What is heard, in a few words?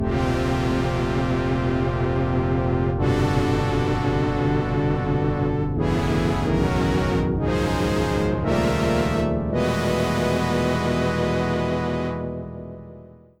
block chords